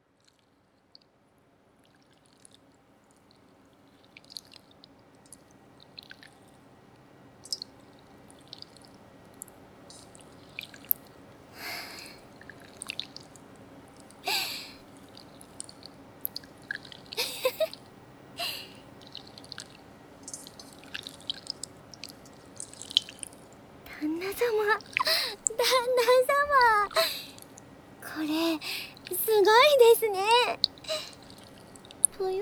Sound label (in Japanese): ぷよぷよビー玉ASMR